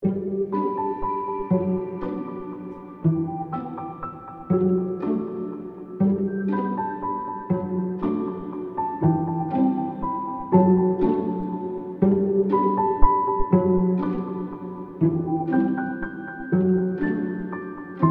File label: Beautiful Ambient